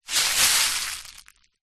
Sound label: food small packet of cous cous tip to side; zapsplat